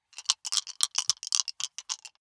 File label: big three rattle in hand closed